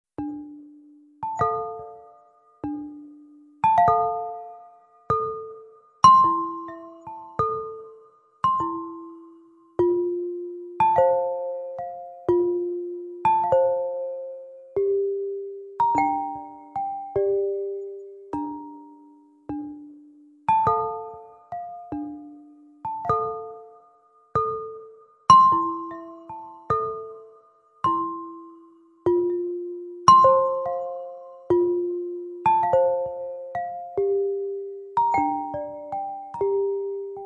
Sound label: The Spring Instrumental